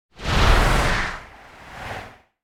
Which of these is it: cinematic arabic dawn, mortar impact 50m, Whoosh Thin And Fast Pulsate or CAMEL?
Whoosh Thin And Fast Pulsate